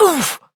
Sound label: Pain, Adult Operator; New War